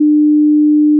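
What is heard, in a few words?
ITD=T4 IPD=0, Interaural Phase Difference (IPD), the signal appears to come from the center; Fundamentals of Sound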